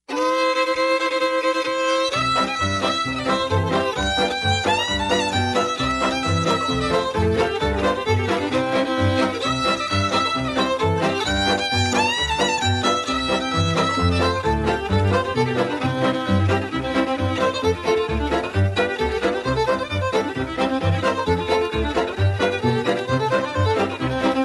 pavalon stomp, square dance music

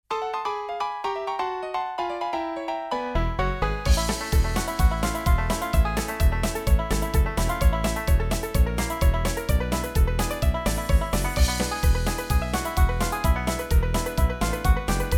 ragtime medley banjo mix, square dance music

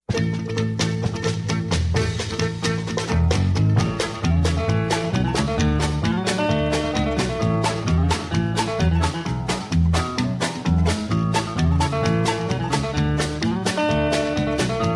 chicken plucker, square dance music

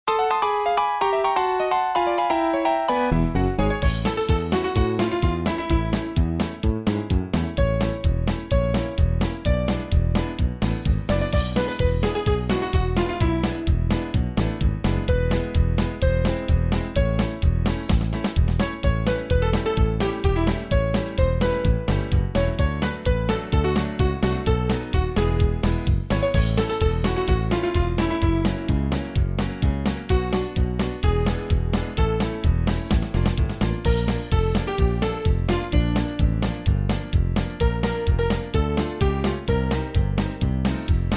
ragtime medley piano mix, square dance music

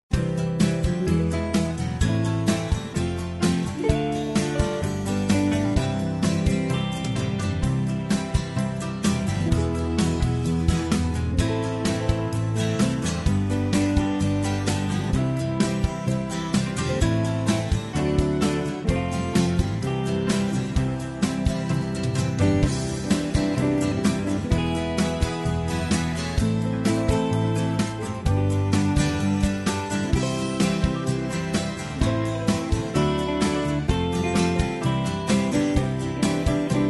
no melody, square dance music

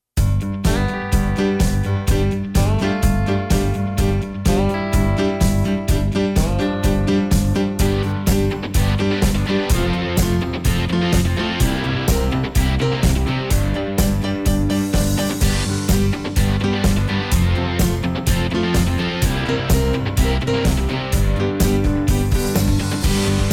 rhythm track, square dance music

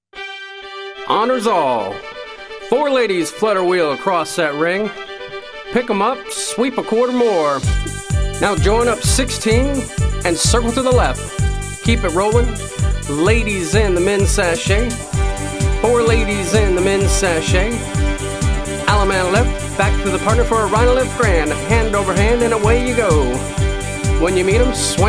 hoedown vocal; abc records; square dance music

